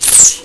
spark

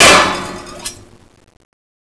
metal, debris

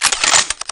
deagle in

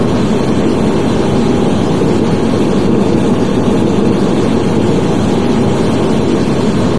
machine, ambience

